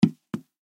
Footsteps High Heels Solid Wood Surface Single Step Right; Foley; Blastwave